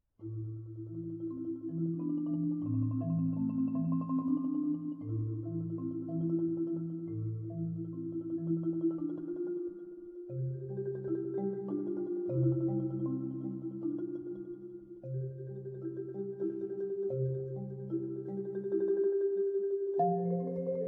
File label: xylos tarrega